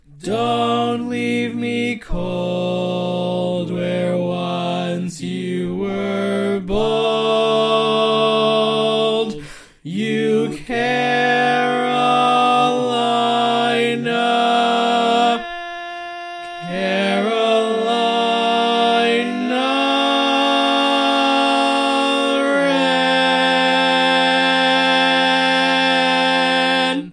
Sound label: Bari, barbershop